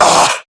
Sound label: Pain, Male